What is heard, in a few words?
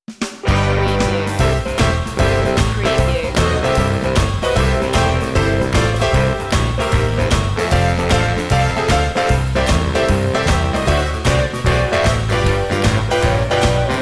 jingle bell jump